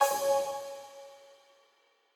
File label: Quasar Clear B